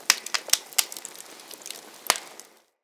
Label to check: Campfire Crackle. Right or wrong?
Right